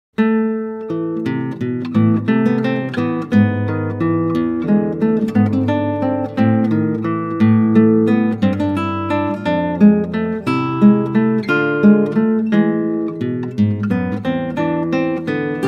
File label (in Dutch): Klassieke Gitaar; Bach 50 Solos